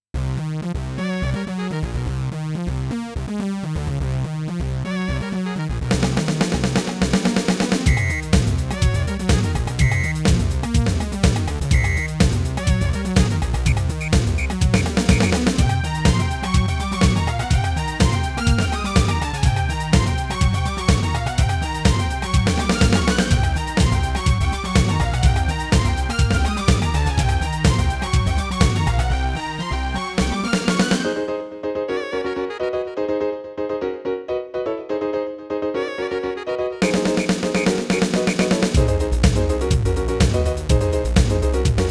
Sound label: SC880; the incredible machine 2